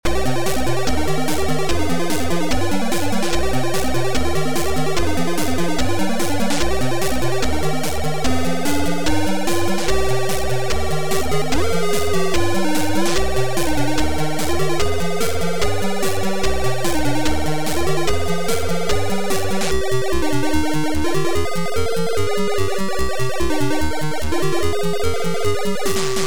Army style intro

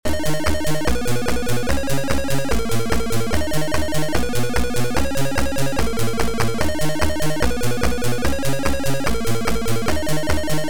GROOVE

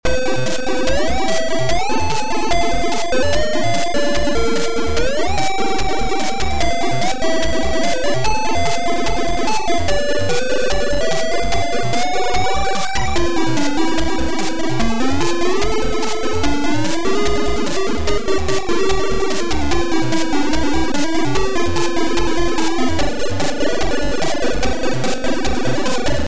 Funny beepy

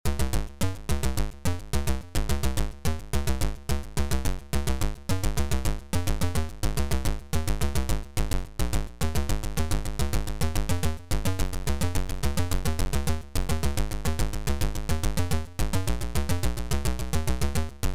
bass theme

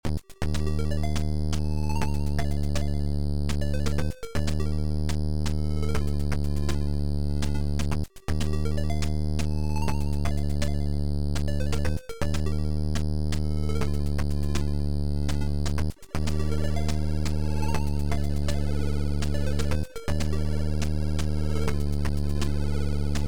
Jazz

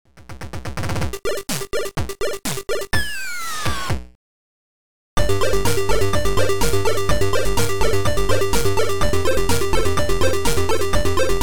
Amiga Chiptune

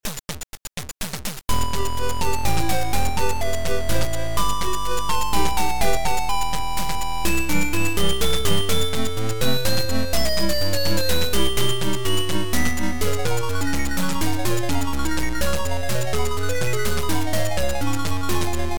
Game Over music

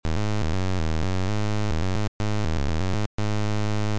Basspart

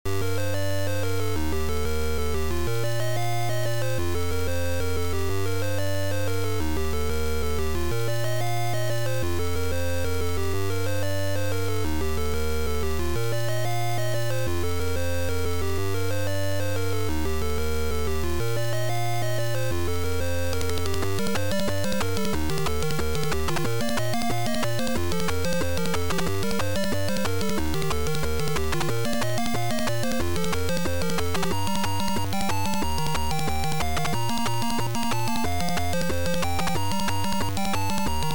Beeper; Kakos nonos; Infection

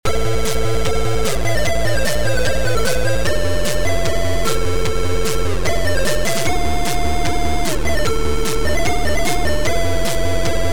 Amiga Chiptune

